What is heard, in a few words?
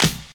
piercing snare drum D# key; snare drum samples